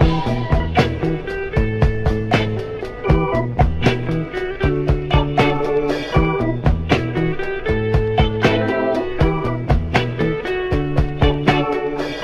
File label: guitar reggae, Loops; Phonky